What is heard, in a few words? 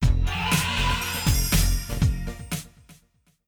elephant; Lucky Fortune; Wazdan